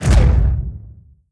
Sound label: Monster Foley Footstep; Monster Generic Foley Footstep 01